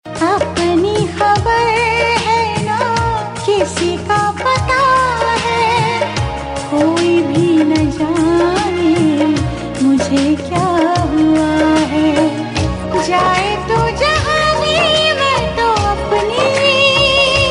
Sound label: Duet Version